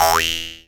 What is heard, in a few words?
boyon